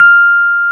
F 0, Electric Pianos; Wizoo Electric Pianos

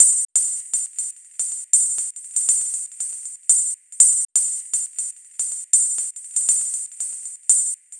Ride Beat, Electronic Beats; Sonic Foundry (Sony Creative Software); Myths of Technology

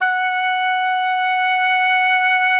Oboe, F#5; Xsample VOL-6

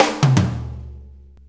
Shuffle Fill, Drum Tools

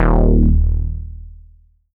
FROG BASS; Masterbits; Dance Trax

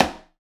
PRC XCONGA, Skin Drums

